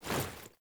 Foley Action Default Jump, Foley Default Clothing; Characters; Player

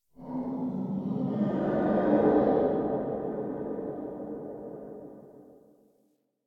Exterior Distant Calls Scared, Ambience

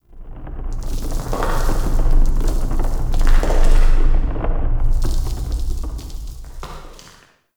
Cave Mine Rumble; Ambience; Midrath Island

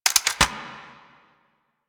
Foley Weapon Swap Accent Rifle; Warframe; Kahl

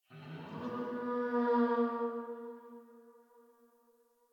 Tree Distant Critters Angry, Ambience; Warframe; Duviri

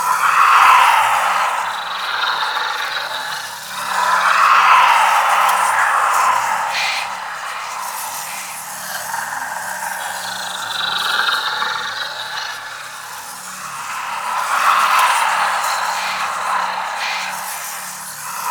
Void Loop; Enemies; Warframe; Entrati Doppelganger

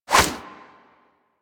Inf Punch Whoosh C; Warframe; Weapons; Infested; Inf Kick Punch

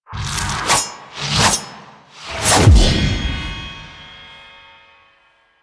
Attack Whoosh; Cinematics; Shrine Intro; Sentient; Warframe; Sacrifice; Umbra

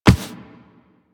Walk Default, Footsteps; Warframe; Operator

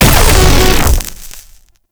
Proto Infested Mutalist Speaker Destroy; Warframe

